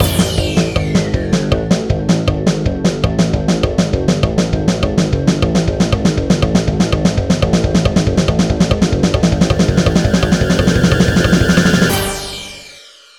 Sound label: Drowning